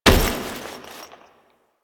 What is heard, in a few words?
Foley Weapon Fire; Warframe; Kahl